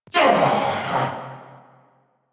Pain Grunt; en; Warframe; Nightwave; Season Three Glassmaker; Nihil Fight

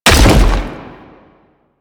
Land; Warframe; Sentients; Erra; Foley

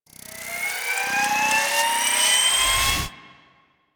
Rail Jack Rifle Reload Charge; Warframe; Tenno

